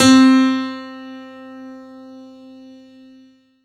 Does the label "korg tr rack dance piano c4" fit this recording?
Yes